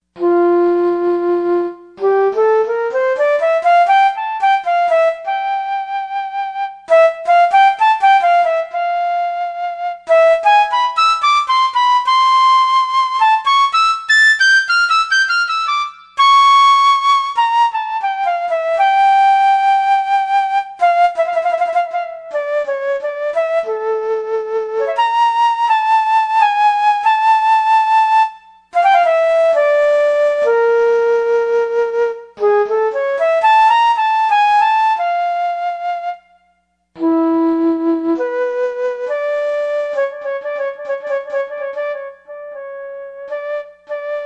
Flute, Yamaha TG55; Factory Presets

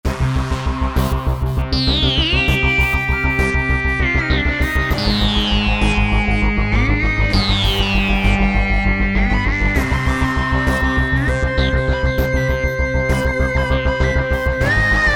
Reso Trip, synth

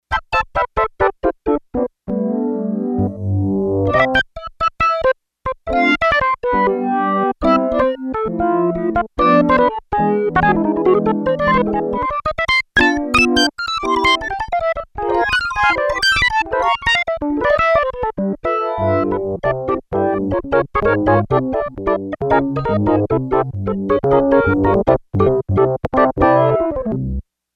Tap Organ, Yamaha TX802; Factory Patches; Voices

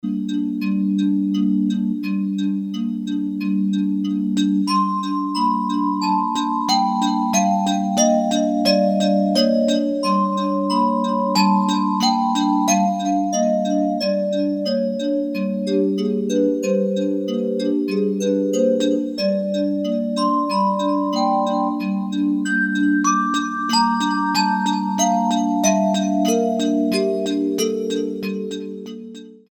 Noise, Ethnic Perc, Korg DSS-1

